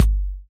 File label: BD; Best Service; Dance Mega Drums